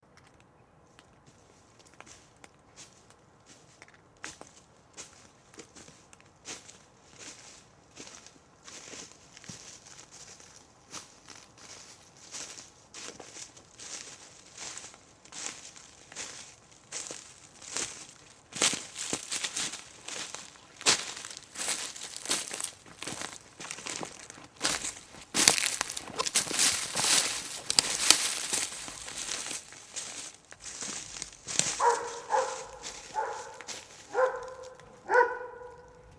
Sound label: walking through woods